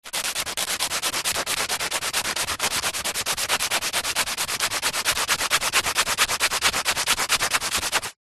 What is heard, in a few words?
industrial sand paper wood small rubs